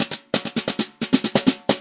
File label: 133 bpm, tappin tappin snare, drum loops and breaks